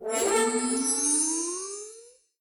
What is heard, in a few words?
multi Attention; robin hood